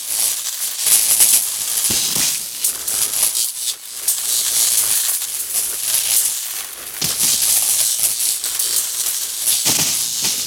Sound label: Asteroid Sparks Loop; Warframe; Ambience; Grineer Asteroid